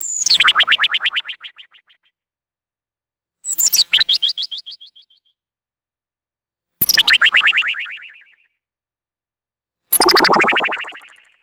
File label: retro synthesized cartoon wobbly accent chirps